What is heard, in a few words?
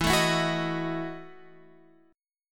E Major 7th Suspended 2nd Suspended 4th E, Guitar, Open F; strummed; x,11,9,11,9,10